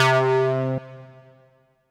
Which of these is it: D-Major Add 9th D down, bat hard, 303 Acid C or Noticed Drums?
303 Acid C